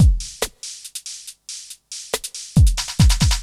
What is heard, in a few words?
techno 140